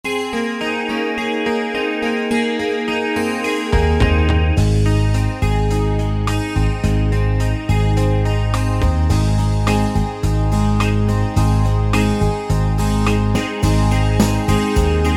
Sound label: folk song duo; wersi